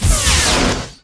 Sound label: teleport out; weapons; dota; furion